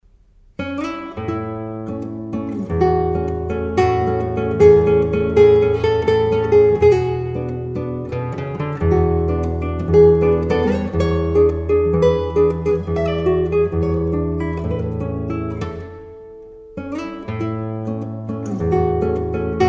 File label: Gran Vals, guitarra; Señor Guitarra; F. Tarrega